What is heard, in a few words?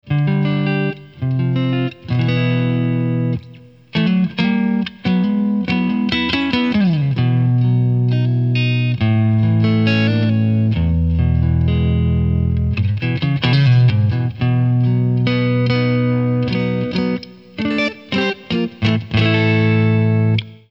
Clean, Cyber Deluxe